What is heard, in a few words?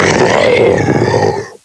Jack Death; Jack Frost